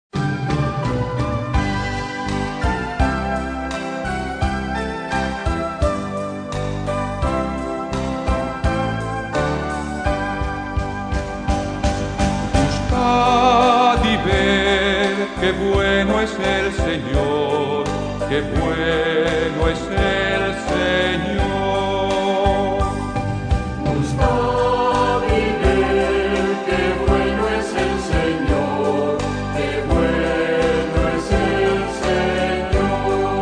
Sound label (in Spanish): Pentecostés (Canto de comunión); Cantos de Entrada y Comunion